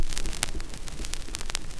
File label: SCRATCH NOIZ; AKAI S6000 CD-ROM - Volume 5; NOISE